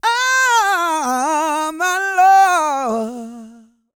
GOSPEL

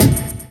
BD RING; E-Lab; X Static Goldmine 2; akai

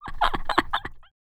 LAUGHS, Jazz Fx, Vocal

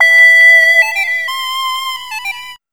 HI SYN; E-Lab; X Static Goldmine 1

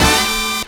HORNHIT, HORN STAB; E-Lab; X Static Goldmine 2

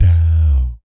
"dow" E2, Vocal Jazz Fx; Spectrasonics; Vocal Planet Vol.3 Jazz Fx

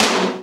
HR16B TOM

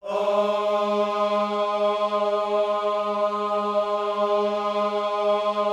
OHS G#3, gospel; Spectrasonics; Vocal Planet Vol.1 Gospel; akai

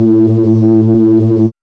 BASS

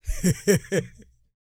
LAUGH, Vocal Jazz Fx

